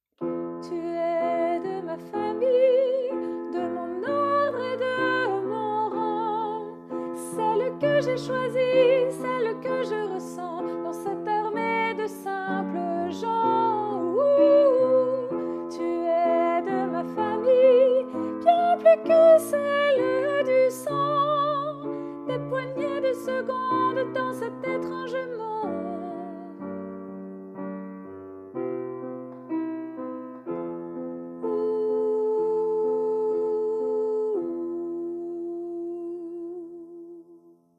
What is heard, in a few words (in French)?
famille dernier refrain soprane